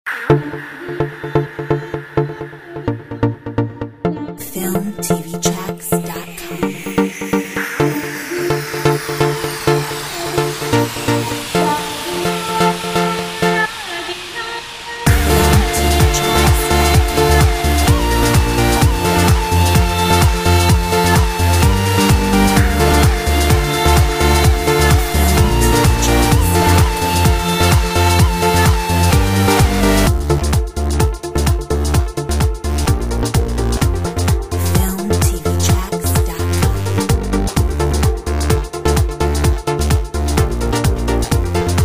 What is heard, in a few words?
World Dance instru, Uplifting Inspiring Royalty free music; Corporate, POP